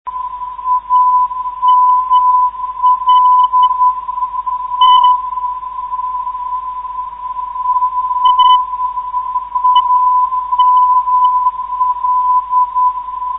402khz, ndb; aml

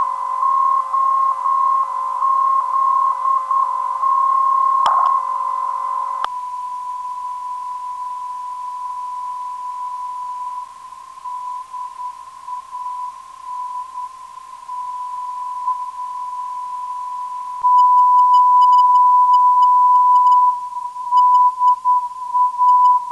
312kHz 400.24 8Hz, ndb; DAN

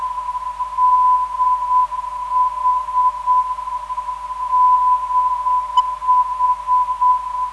325kHz, ndb

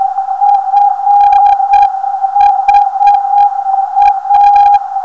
471kHz, ndb; FHA

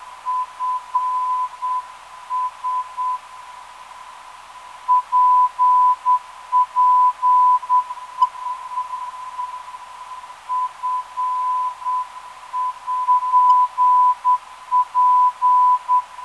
412kHz, NDB

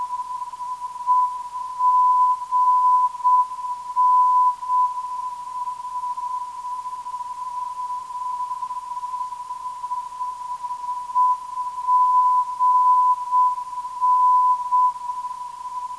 382kHz, ndb; EGN

